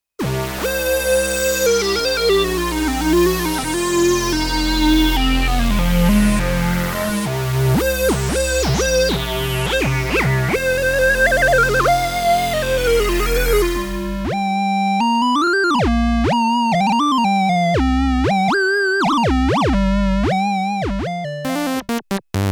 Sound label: leads